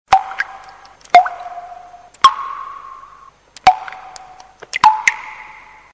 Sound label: Raindrops